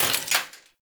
Arch Rocket Crossbow 2D Mech; Warframe; Archwing

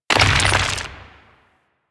Pass Return Cave Door Start; Ambience; Gameplay; Warframe; The War Within

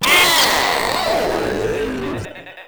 Proto Infested Runner Death, Enemies; Warframe

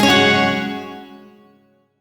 Shawzin Yo Chord; Music; G; Warframe; Duviri; Chords

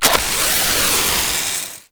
Proto Infested Maggot Spark Start

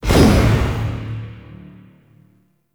Painful Reprisal Throw; Warframe; Warframes; Pax Duviricus

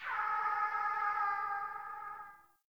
Venus Landscape Distant Robot Call; Warframe; Venus Open World; Ambience

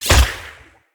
Hunter Bow Ranged Shot Variant; Weapons; Player; Envoy; Bows

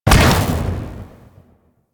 Amp Barrel Fire, Amplifiers; Warframe; Operators; Corp Amp Set One; Barrels

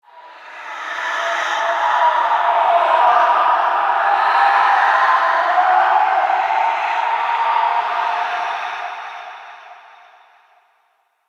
Death Wind Gust High, Ambience; Red Night

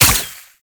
Spiderling Web Shoot; Enemies; Gameplay; Boss; Warframe; Oraxia

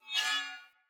Cleaver Whoosh Accent, Swords; Weapons; Player; Bromius Cleaver

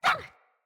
Kubrow Puppy Idle Bark; Vocals

